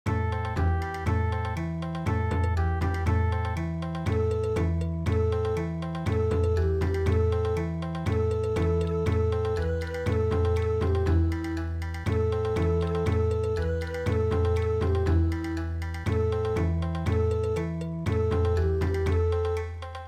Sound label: Bon Odori